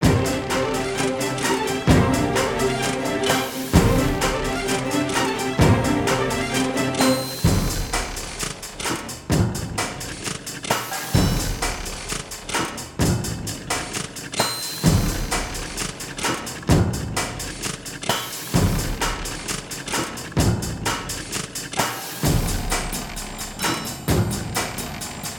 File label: Steampunk Suspense (Percussion)